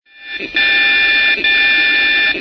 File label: DCF77